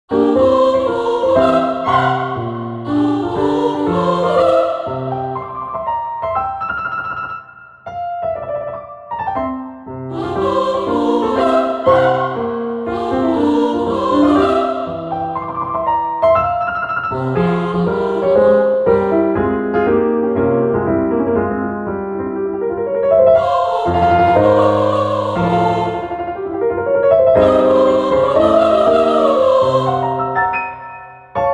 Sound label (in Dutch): zang piano 120bpm